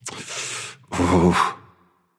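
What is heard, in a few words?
non verbal ouch, voice; cernunnos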